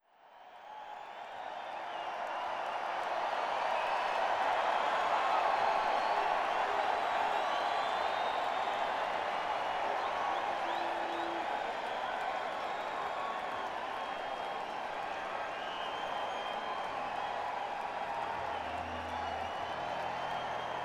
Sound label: Crowd; Las Vegas, NV - MGM Grand Garden Arena